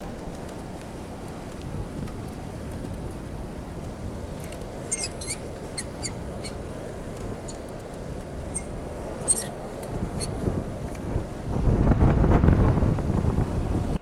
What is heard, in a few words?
blustery wind with swinging metallic clanking